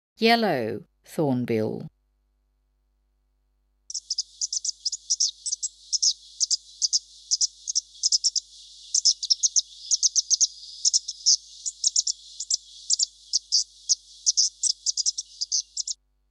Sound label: Yellow Thornbill